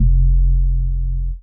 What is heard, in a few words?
Sub, drum; Subs; Drum Bits; layering